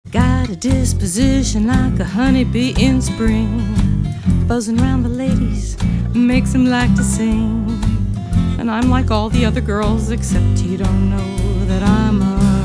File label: Dirty Old Lady Blues